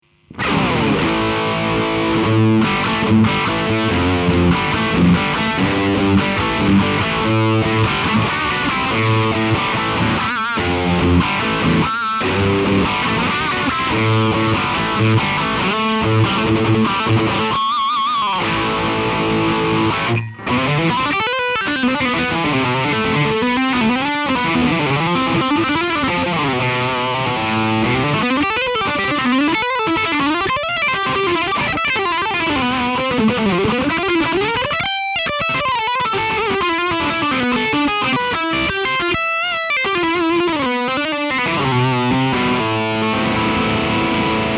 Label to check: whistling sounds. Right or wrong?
Wrong